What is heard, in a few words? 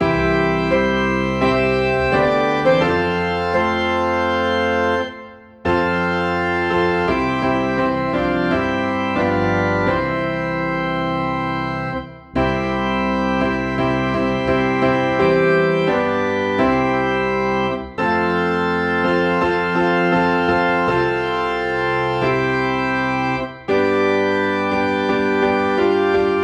Piano and Organ in C